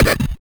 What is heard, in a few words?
Drums; Distored Reality